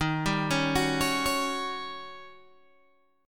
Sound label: Eb 7th Sharp 9th, Eb, Guitar, Open G; 13,12,11,11,14,11